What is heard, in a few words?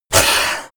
yeti hiss weak; kreaturen